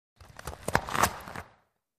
Ice Skating Skate

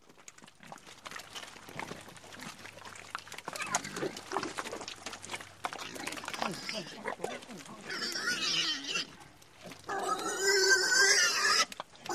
Pig Squeals Eating

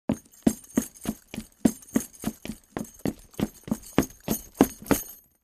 Footsteps Male Runn

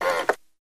Thread Mechanism, dat machine

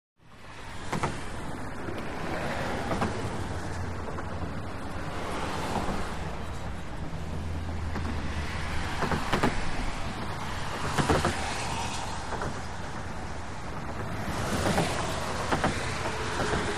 Highway Traffic Bys, big city

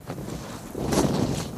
Blanket Flap Single Up; flags

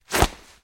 Nylon Swishes Quick, whooshes & swishes

